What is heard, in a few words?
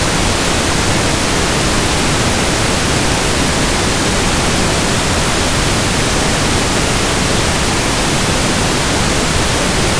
pink noise